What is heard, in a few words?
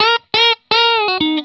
RIFF 120, Vintage Blues Guitar